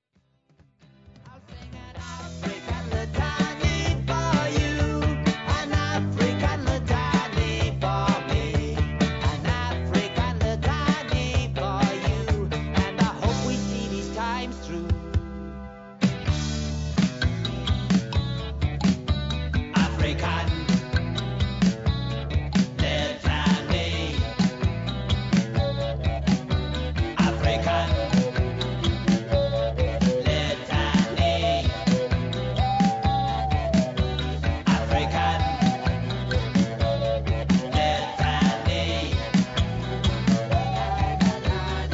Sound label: african litany